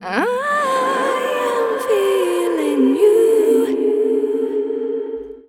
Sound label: Feel Complexi Verb